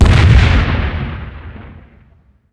explosion grenade distant; Explosions

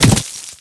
punch stone

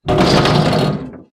objects, World of Tanks

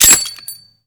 mine betty click; Explosions; Call of Duty Modern Warfare

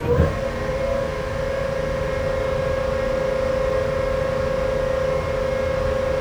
Aircrafts Gear Electric Loop; DCS

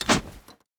salvaged axe attack; Rust

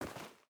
footstep boots dirt walk; Rust

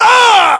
death, english

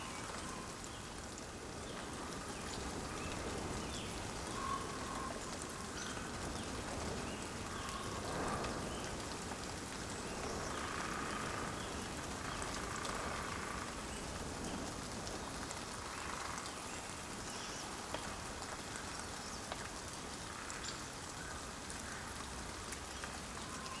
ambience coniferous forest day breeze drizzle; Rust

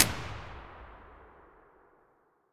akm close loop tail; Escape from Tarkov

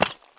run tile; Escape from Tarkov

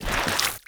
muck slip

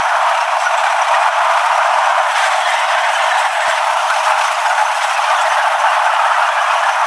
Vehicle Engines; Red Orchestra Ostfront 41-45

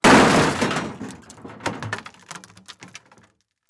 metal gib; Rust

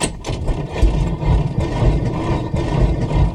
P-51D cockpit canopy open, aircraft; DCS

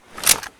Inf Weapons Foley; Red Orchestra Ostfront 41-45

